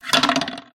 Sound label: spin wheel